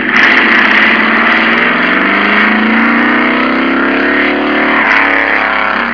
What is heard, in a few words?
car with glasspak muffler; The sound of the wind-up and deceleration of a flathead V8